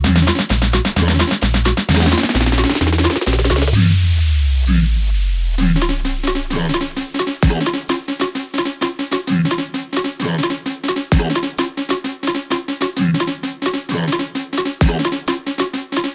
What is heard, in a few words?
130 BPM